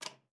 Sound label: KLPB C5, ALTOSAX; Xsample VOL-2